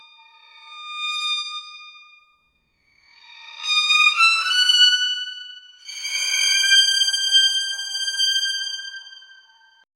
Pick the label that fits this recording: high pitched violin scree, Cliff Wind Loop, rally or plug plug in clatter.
high pitched violin scree